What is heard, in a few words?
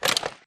reload magpull; dmr; Titanfall 2